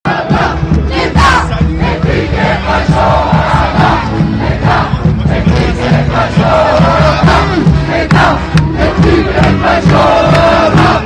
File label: signal